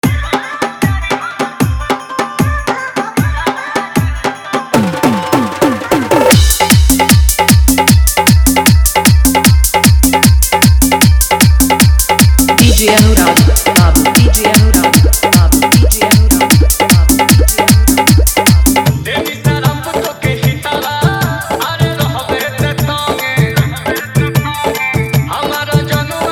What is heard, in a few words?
Bhojpuri Full Dhollki Bass Remix